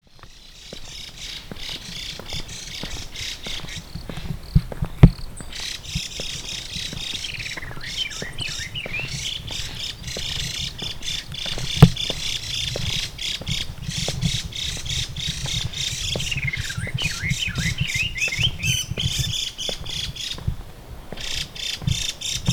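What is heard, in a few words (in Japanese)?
ガビチョウ 地鳴き; 群馬県富岡市; bird song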